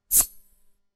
puzyirk vozduha melkiy pshik, shprits

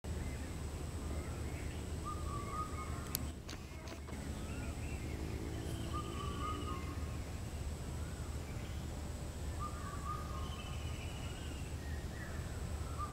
golos korshuna v dnevnom nebe

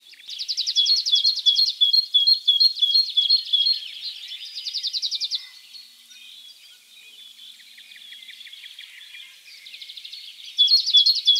zvonkoe vesennee penie v podleske, zvuki sinitsyi

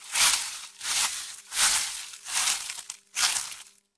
listya shurshat pod nogami

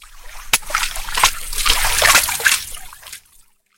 luji posle osennego dojdya

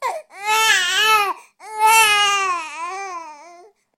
mladenets kapriznichaet

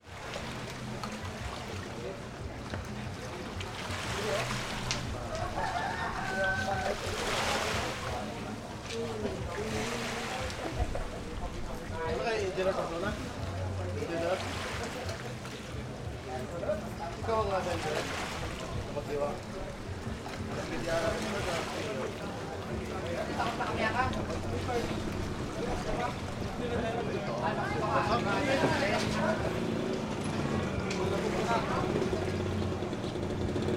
shum morya i golosa na fone

